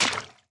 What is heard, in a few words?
Horse Water